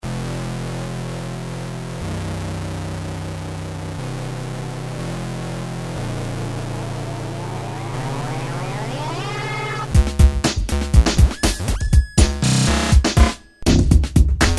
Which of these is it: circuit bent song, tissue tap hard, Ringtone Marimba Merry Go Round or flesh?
circuit bent song